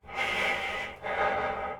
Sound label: Player UI; Five Nights At Akers; soma